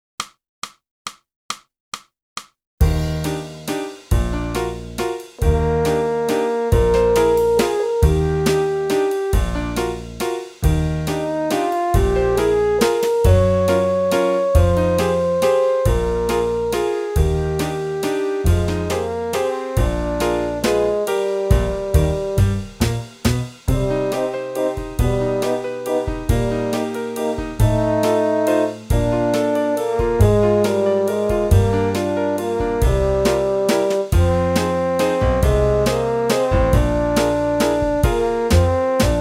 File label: sax